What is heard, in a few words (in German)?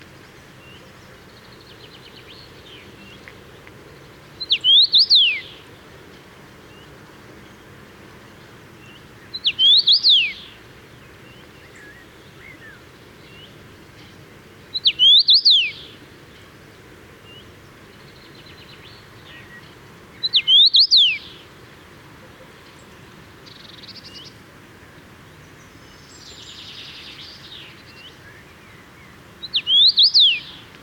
Karmingimpel, Murnauer Moos; Michle Peron